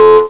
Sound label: Bouncy bleep; Instruments